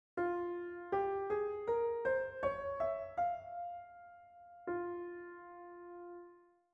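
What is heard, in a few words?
F minor scale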